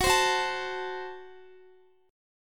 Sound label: Gb Diminished Guitar